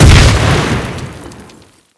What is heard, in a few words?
tank shell; wac